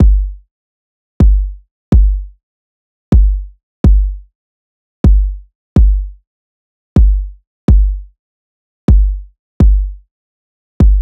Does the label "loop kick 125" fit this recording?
Yes